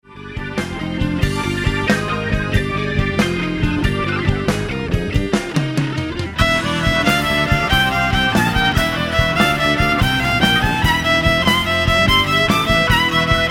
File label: epic